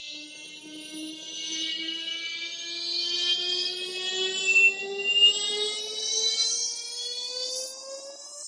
synthetic turbine whineup; Electro and Synthetic

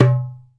tabla dum

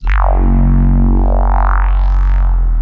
nasal bass synth tailbend; Basses

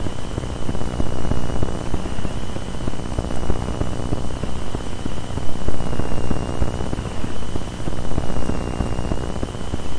096 electronic interference dirt noise loop; LOOPING AMBIENCE

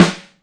just a big ass snare; single hits

